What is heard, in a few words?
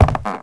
step stairway; people; Pirates Of The Caribbean